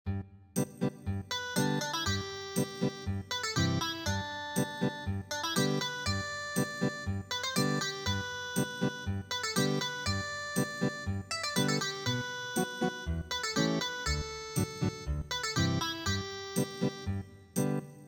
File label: Ethnic Guitar